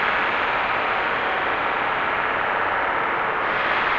sparse soundscape samples; ReLooped

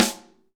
snare dry; Real Mega Drums

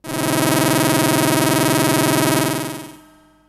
FX, Drum & Bass; Sonic Foundry (Sony Creative Software) - Fluid Dynamics Drum & Bass

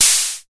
HHO, UDS

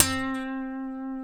D, funk guitars

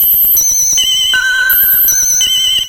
SPACE SEQ, SCI FI; AKAI Anime Sound FX

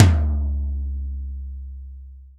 tom, Real Drum Kit, funk kit

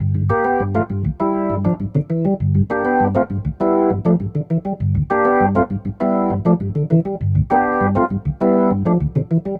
Rotor Riff, Organ; Loops; Rotor Riffs; Sonic Foundry (Sony Creative Software); Joe Vitale Organ Donor

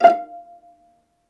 violin; ILIO; Synclavier Strings

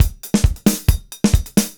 135, Mediteranean Traditions; Soundscan vol.53; AKAI

